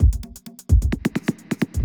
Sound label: electro kit 130